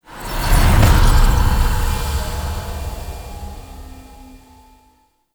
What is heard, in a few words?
Transition Stinger Out; Magulis; Warframe; Tau